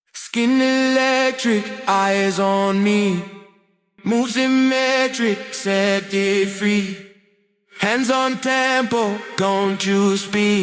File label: Loopsy, afro house; production suite